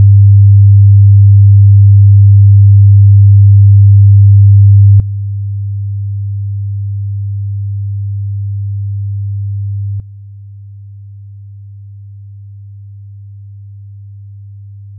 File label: Comb sine 100Hz; Original Conventional AK5736